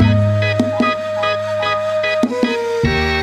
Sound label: flute, Jazzmatazz loops